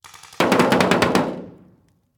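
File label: Paintball Gun Impact; Sound Ideas; Guns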